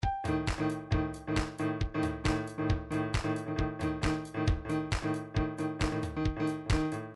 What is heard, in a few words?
trance midi loops beats; Newtronic Trance Constuction Kit; sfx afrca